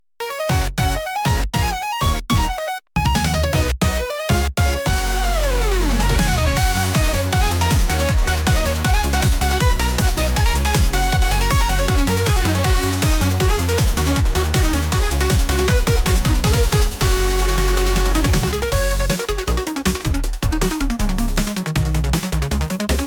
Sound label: Rhythm Funk